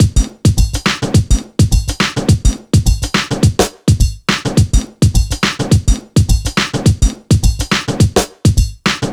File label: 105 Ragga, Breaks; loops; 105 BPM; Loopmasters; Jon Carter & Alex Blanco; Block Party Essentials